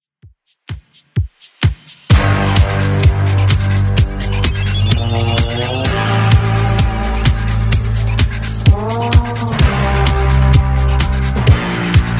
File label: 80s Redrum 128bpm